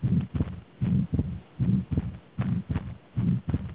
defeito septo atrial; defeito septo atrial page

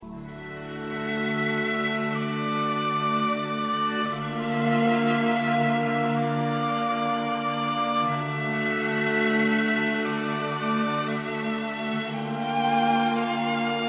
Musica

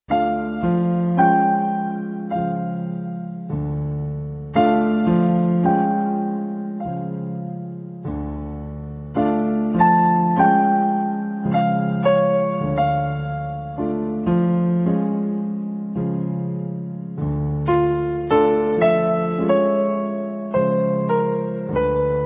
Musica

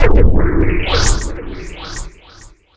Teste Loop

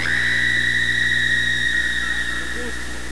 som mizuha gritando

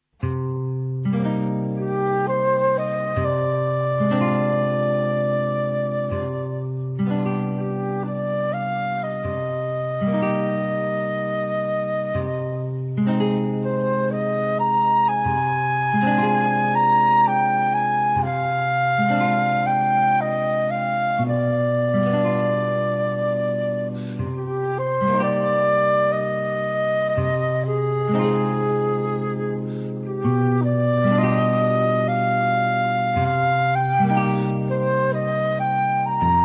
Musica

